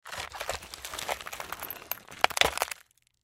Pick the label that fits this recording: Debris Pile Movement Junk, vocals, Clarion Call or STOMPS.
Debris Pile Movement Junk